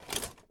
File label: armor